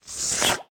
Kiosk Juice Squeeze

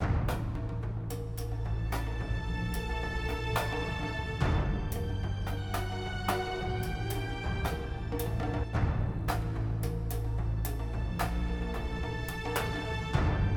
Creep Low

